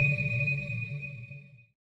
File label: sonar Tail Water Medium Directional; Barotrauma; Real Sonar